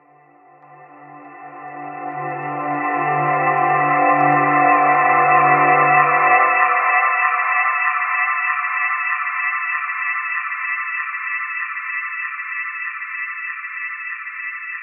Drifter, Underground UK House & Electro, Pads; Sonic Foundry (Sony Creative Software)

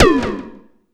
fx-percussion, Techno; Techno ID; single-drums

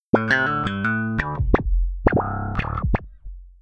Electric Bass

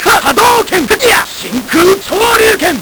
Nintendo Gameboy LSDJ

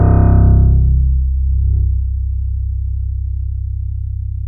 PNO THICK; MORPHEUS

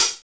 HH, REAL

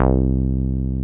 303 BASS, Roland Synth Bass, Analog Bass; Roland - Rhythm Section

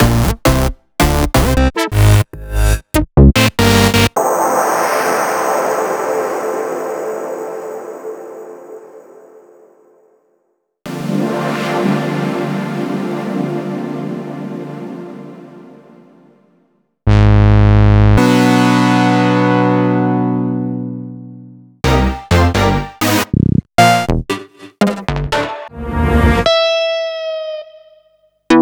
Synth Shots

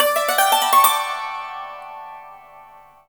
YANG CHING, Heart of Asia; Spectrasonics

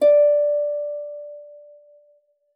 C#5, HARP PIANO; Xsample VOL-8

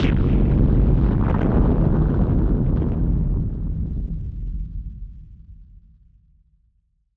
Hit Splosion; musicradar; bomb factory

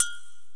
Korg x5, Drums

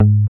GUI MUTE, E-GUI MUTED

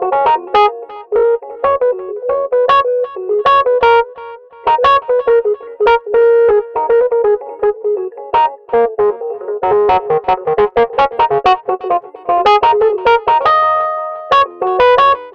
Rhodes Solo, Cinematic Funk; Foundry (Sony Creative Software); Cinematic Funk Urban Tone Poems; Solos

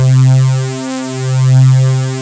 SAW SYNS, JV 1080; Pro-Rec - Synthsonic

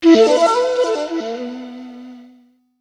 FLT G, SPECIAL FX; Best Service ProSamples vol.55 - Retro Sampler; AKAI

